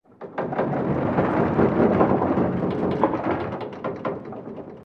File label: ac130 rattles; modern warfare 2; amb elements